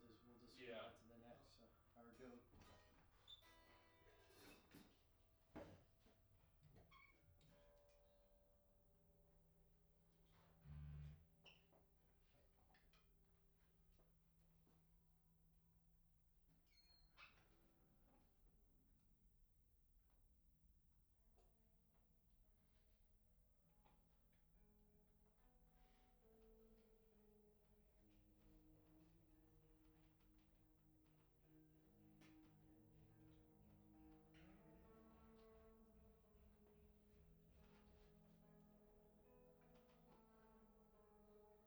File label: MS Fathead Drums